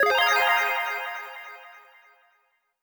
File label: Reward Jingle; Splatoon 3; Side Order; After Ending